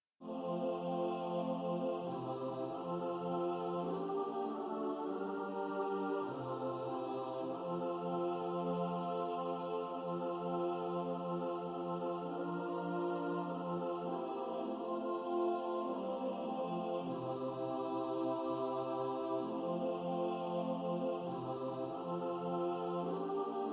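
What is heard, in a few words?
When I survey the wondrous cross alto, choir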